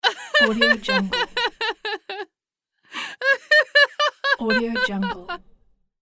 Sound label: woman playful laughter; floraphonic